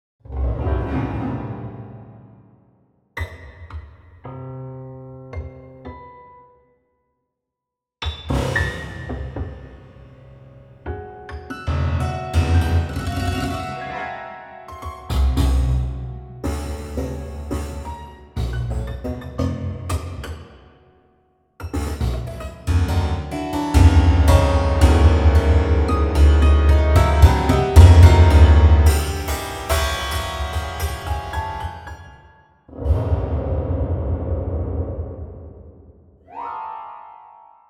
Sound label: Prepared Piano